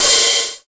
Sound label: small reverb open hat c key